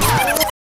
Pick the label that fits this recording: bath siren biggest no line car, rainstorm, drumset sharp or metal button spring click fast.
drumset sharp